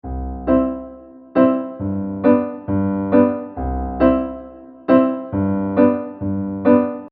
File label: reggae